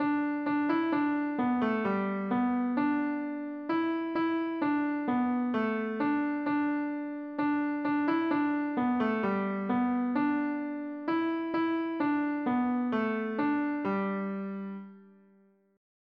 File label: piano; normal tempo; PlayScore